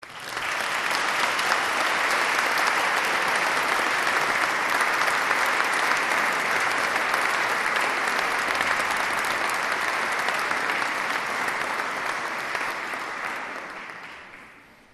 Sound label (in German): Applaus Unterstufenchor